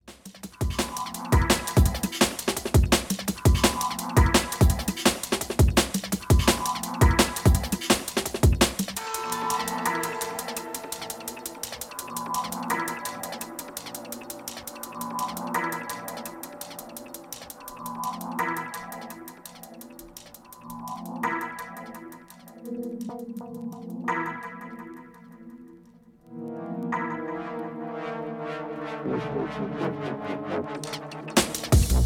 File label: juggling bassdrum